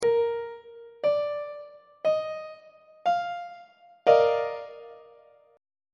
d sharp major 7th suspended 2nd 2nd inversion on treble clef